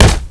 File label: machete hit